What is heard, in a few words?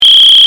whistle